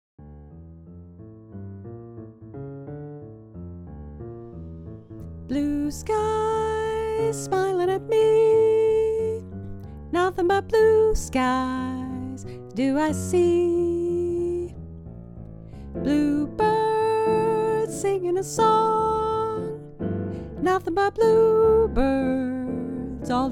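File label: Soprano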